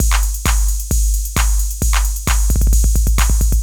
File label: Beat Mix, 132bpm; Construction Kits; Big Fish Audio - Old Skool House; OSH Belgium Beats